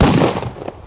clwn slap

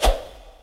hero attack; diver jimmy

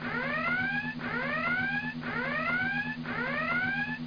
red alert

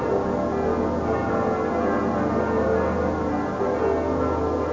bells; Workbench; BoingBag1

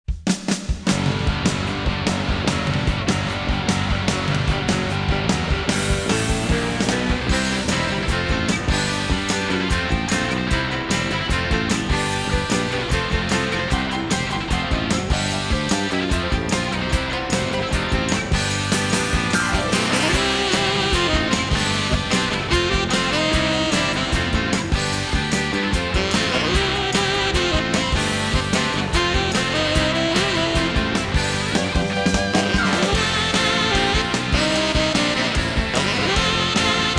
SLOT BGM; Let's Play with Sakura!

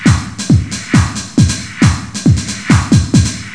beat; Amiga-CD 1996 #5-6; sampler

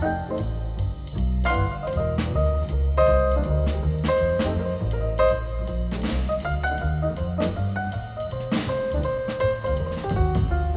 up Loop; vrml

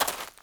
step; wob2 demo

